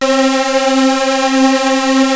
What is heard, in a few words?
Prophet 5 C5, Synthesizer; Hollywood Edge - Giorgio Moroder Rare Synthesizer Collection